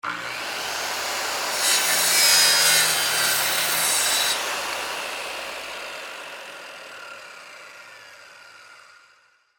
Industry, Circular, Saw, Cut, 2X4, Wood, On, Run, Off; Saws